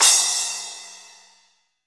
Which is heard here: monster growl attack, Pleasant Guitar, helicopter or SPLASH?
SPLASH